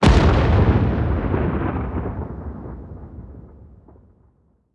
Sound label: plane; World of Tanks